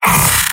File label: soldier pain sharp; vo; tf2; mvm